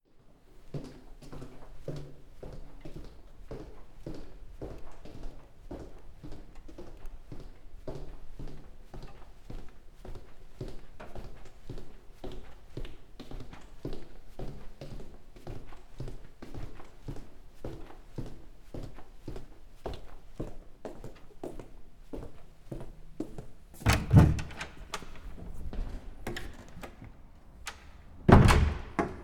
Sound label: Steps In Corridor With Pushing Door Open Close